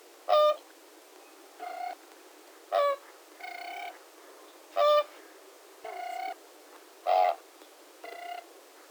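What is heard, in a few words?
sterh golos, Siberian crane voice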